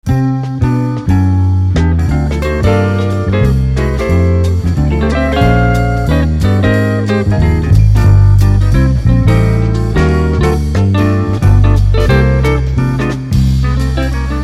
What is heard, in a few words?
4 Guitars Instr